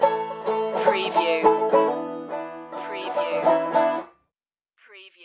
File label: country stinger